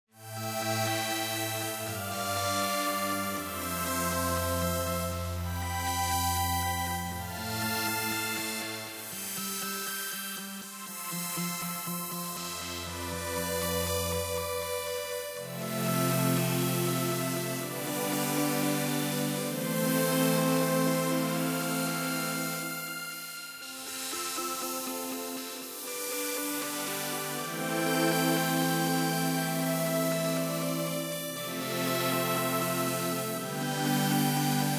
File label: pad; 铺垫; Korg RADIAS 合成器; 所有声音均由RADIAS发出，只用了一个音色（无音色叠加）